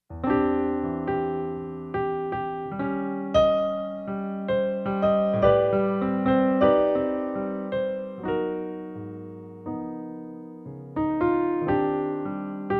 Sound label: ballad idea Eb with rough bridge